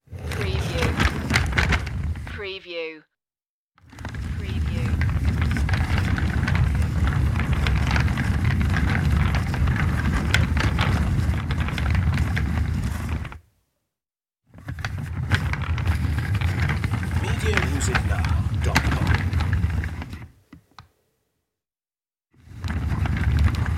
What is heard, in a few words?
rolling office chair